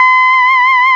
synthesizer C5; OLD MODE SYN

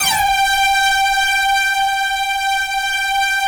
SQUEAK G4, Yamaha VP-1; Music Store

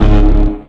Hybrid Bass One Shot; Drum & Bass

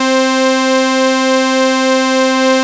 MOOG PRO, BASSES

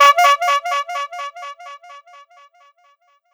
HORN ECHO L; Ragga & Dub Reggae; AKAI; USB Soundscan vol.27

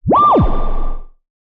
Simple OSC Spring Reverb

